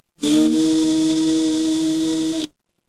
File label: animal insect